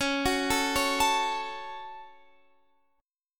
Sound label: Db Suspended 4th Sharp 5th